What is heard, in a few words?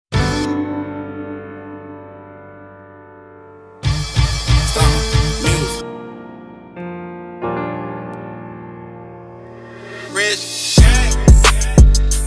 Instrumental